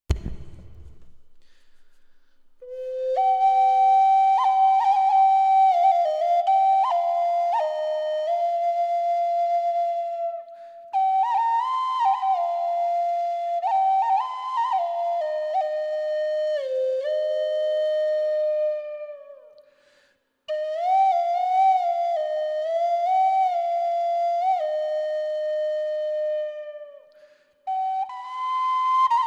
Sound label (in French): pipeau do432